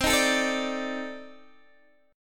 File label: C Minor 6th Add 9th C Guitar DADGAD